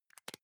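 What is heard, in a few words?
deodorant can lid close soft, badezimmer; haus-garten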